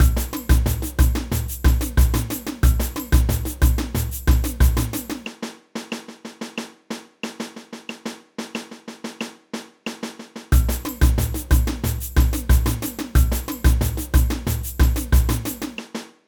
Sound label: caixa, samba; samba band